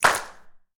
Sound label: hand clap sample f key; single hits one shots